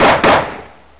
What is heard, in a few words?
pistol